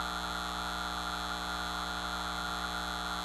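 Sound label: ambience neon sign; angel city; Titanfall 2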